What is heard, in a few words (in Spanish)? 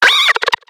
Grito de Simisear